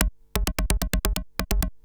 Industrial Beats

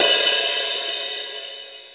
HH OP, Drum Dry; East Collexion; Drum 1 Dry

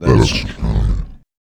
VOICE FX, Dance Vocals

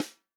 snare drum, HIPHOP SNARE CHROMATIC; Best Service ProSamples vol.43; Real Drum Kits

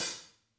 HAT; Sonic Reality - Vintage Timetraveler

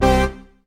SYN SYNTH, dance synth; Sound & Vision - Gigapack I CD 2

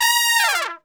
A#3, Brass, TRP; Best Service; Brass Super Section

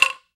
ONLONG, World Percussion

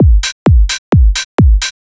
4 floor beat, trance

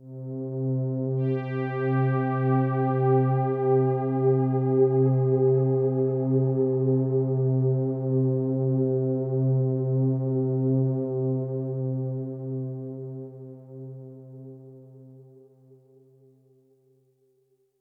Pad C, Synth Prophet One Shots; Pads; Electronisounds

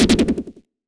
fx 16bit dub lay; neuro; Sinistarr; Synths and FX

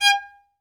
Synclavier Strings, SOLO VI; ILIO

